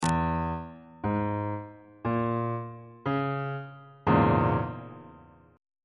a sharp half diminished 7th 2nd inversion on bass clef